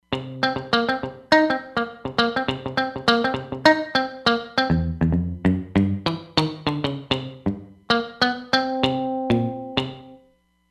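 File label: Muted Guitar, Roland Fantom XR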